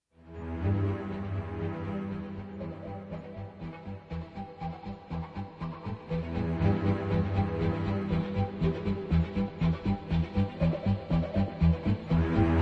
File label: Threat Ambient